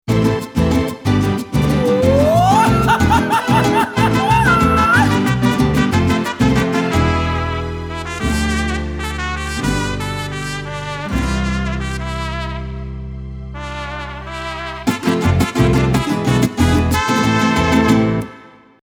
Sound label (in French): musique mexicaine